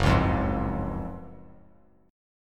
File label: Gb Major 7th Suspended 2nd; GbM7sus2 strummed; JGuitar